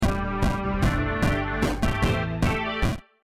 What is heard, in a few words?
BeepBox Song